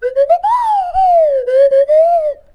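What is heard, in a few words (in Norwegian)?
slidefløyte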